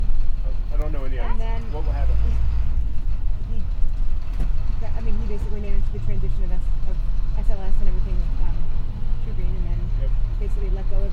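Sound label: bus, New York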